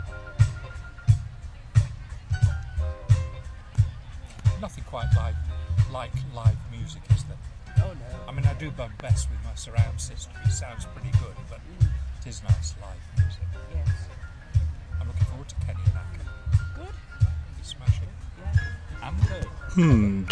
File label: jazz